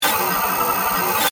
Scanner Ray Scan, Scifi; Science, Fiction; Tech Sound Effects; BlastWave

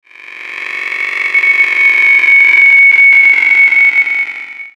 Ascend Feedback Static Swell; Blastwave

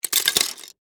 Legos Drop Handful, Into, Box, Plastic, Toys; Foley; Drop Sound Effects

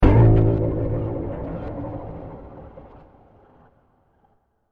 Scary Stab Heavy Mangled With Echos; Digital; Impacts; Sound Effects:Impacts; CraftedSignals

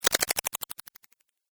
Ghost Whoosh; Ghost Sound Effects; horror

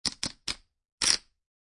Poker Chips Stacking; Poker, gambling, chip: Stacking poker chips.; Card Sound Effects; Casinos; Lynne Music

